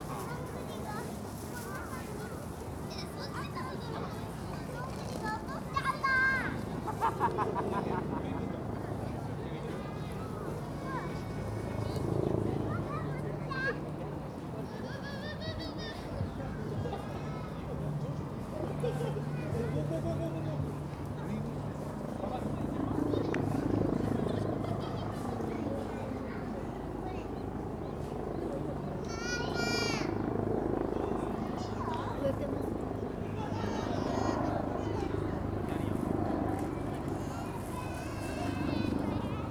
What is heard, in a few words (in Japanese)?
新宿御苑